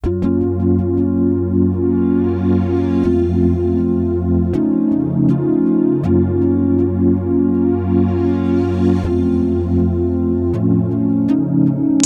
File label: Haaaaa 80 BPM, rap